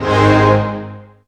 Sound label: Choir Brass; Zero-G Jungle Warfare 1, 2 & 3